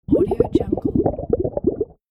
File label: underwater bubbling; audioheart